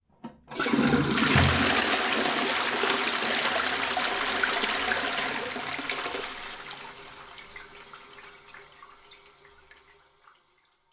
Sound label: Toilet Flush